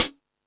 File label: Latin Layers, Drum Machines E-MU Pro-Cussion